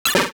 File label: hit; PikoPark